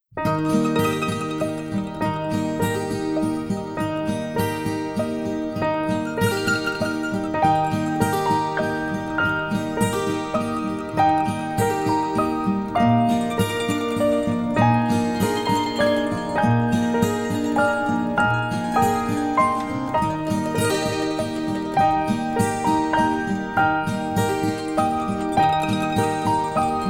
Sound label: Rock A Bye Baby Inst